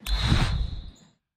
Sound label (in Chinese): 战斗开始球员排阵音效; nba; 战中